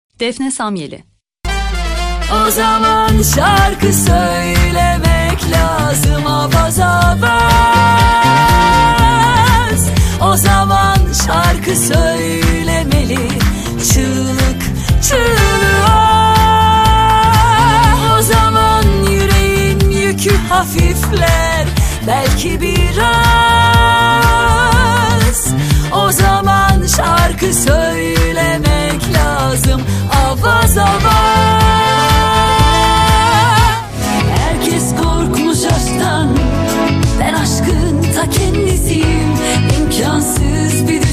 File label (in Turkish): vokal